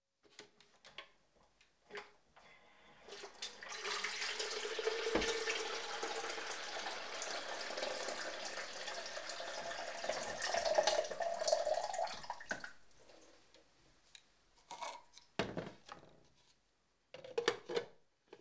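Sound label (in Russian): электрочайник закипает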